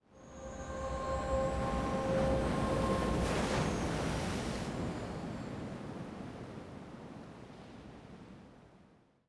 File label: intro beach; spirit blossom